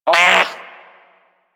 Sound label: Armadillo Vocal Pain; Characters; Warframe; Hunting Critters